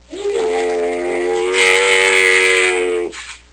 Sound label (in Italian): dromedary